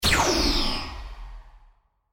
grav shot; Weapons

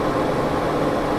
fan; Dyno

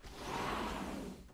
door open

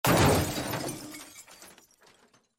crash, Vehicles

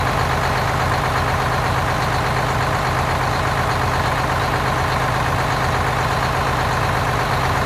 loco loop

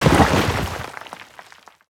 ore fall

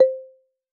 tab switch

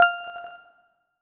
message, reports

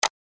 click

